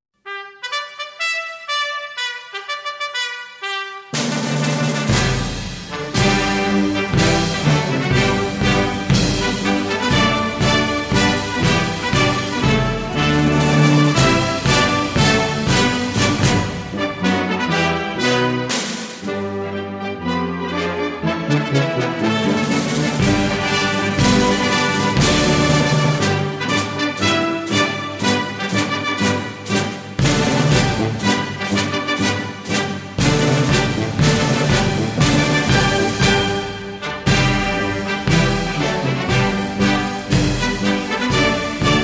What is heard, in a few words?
china national anthem